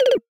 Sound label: Death Jingle